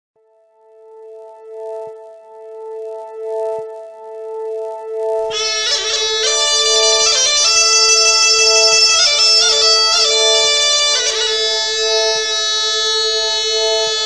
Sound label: a gloomy melody, bagpipes